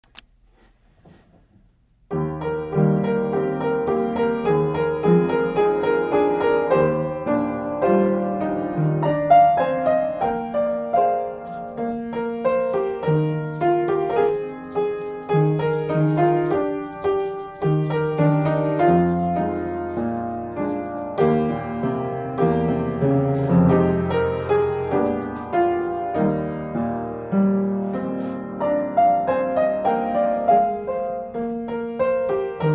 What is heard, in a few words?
piano